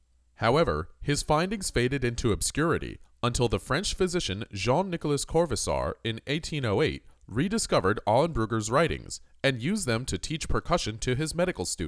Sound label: Percussion